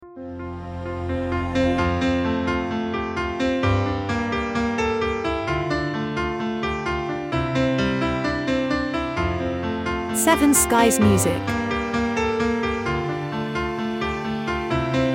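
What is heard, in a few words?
Successful Corporate Theme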